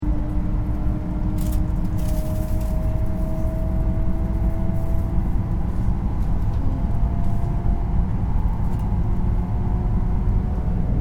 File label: Train JR Shinkansen N700 Japan Interior Accelerate Ambience Stereo; Japanese Trains; Train Sound Effects; Vehicles